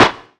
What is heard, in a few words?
short snare single hit b key